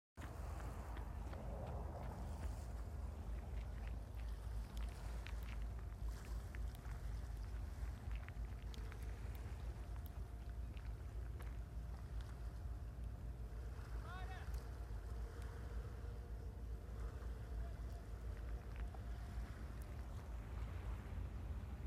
River Tagus Lisbon Portugal

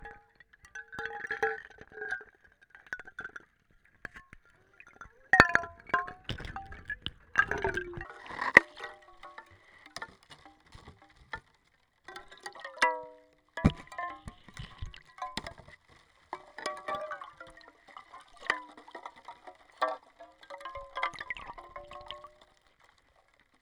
Ice, frozen pool London

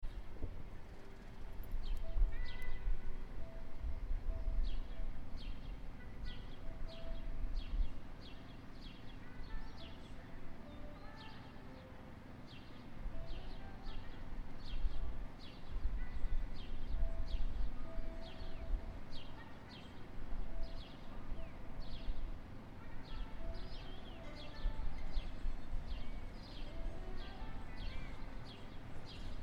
saxophonist

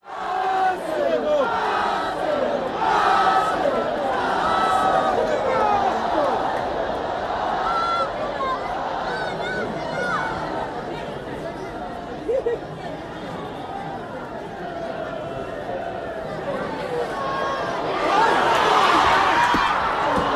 Arsenal vs Man United WSL 0-0 draw, Emirates; Emirates Stadium London UK

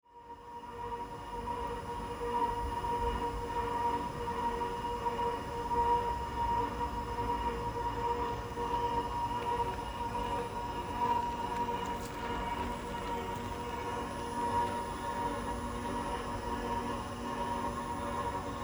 Ambient bus, Shadwell London

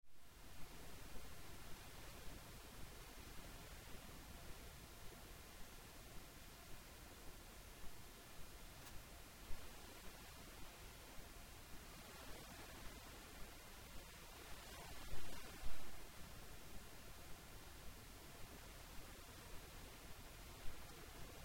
Yesnaby Orkney in July; Will Pritchard Field Recordings

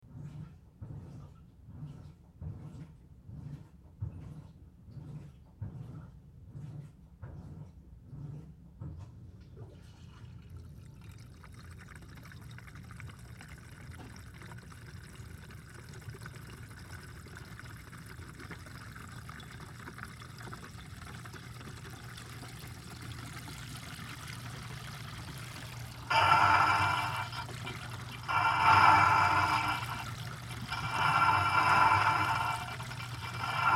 Laundry draining inside; of the cabinet under the sink in the laundry room, New York, USA on 10th August 2023; water draining through the pipes after a washing machine spin cycle

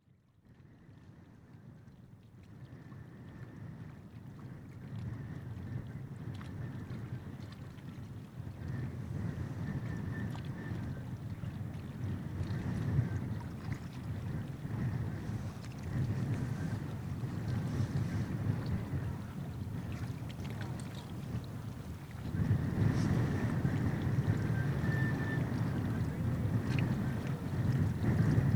wind and iced water; Jokulsarion

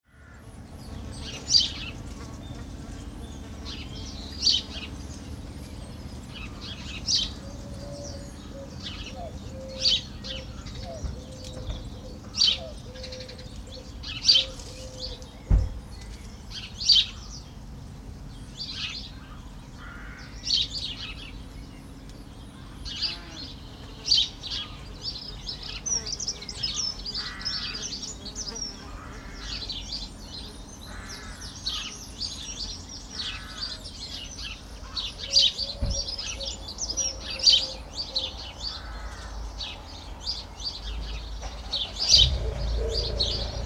Suffolk Garden, field recordings; uk

